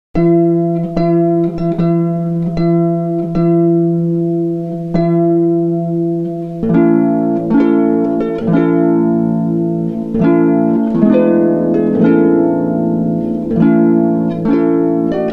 Bridal Chorus, harp; Here Comes the Bride

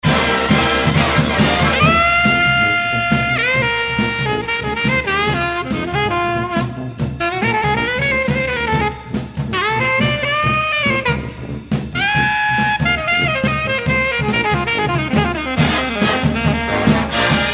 big band, brass

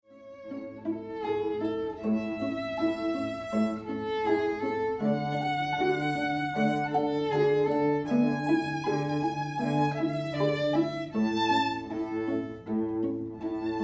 Largo, string quartet

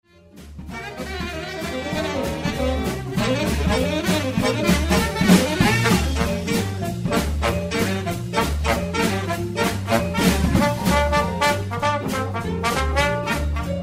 Jump Jive; John Clark Entertainment

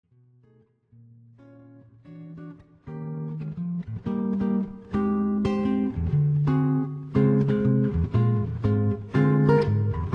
solo Jazz guitar; Swing

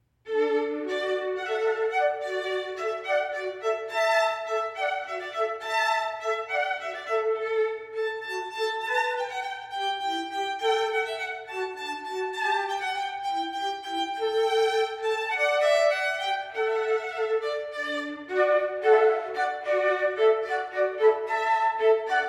Flute Violin Duo - Hornpipe; Classical; David Turner Music Ensembles

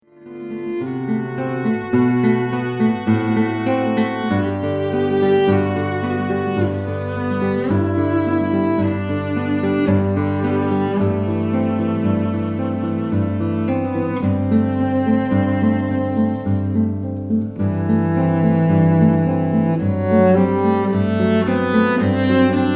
cello And guitar; Classical Guitar; The Swan

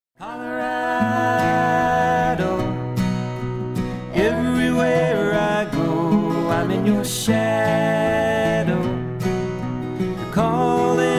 acoustic duo; colorado

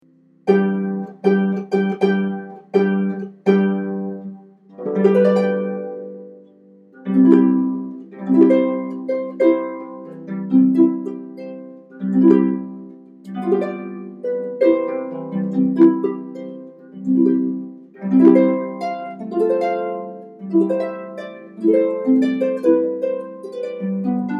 Bridal March, harp

